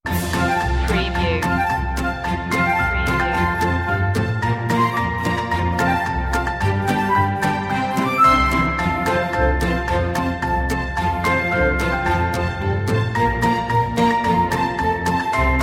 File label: orchestral; jingle bells; media music now